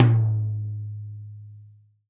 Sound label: tom, Real Drum Kit, funk kit